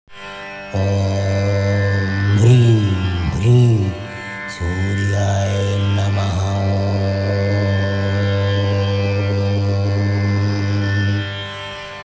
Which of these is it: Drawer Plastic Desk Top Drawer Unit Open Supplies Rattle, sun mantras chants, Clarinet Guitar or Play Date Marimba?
sun mantras chants